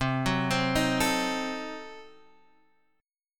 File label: C Diminished 7th down Guitar Half Step Down